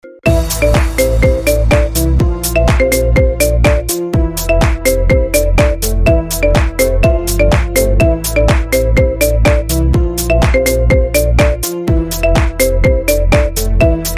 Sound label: marimba remix ringtone